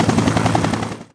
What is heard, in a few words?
helo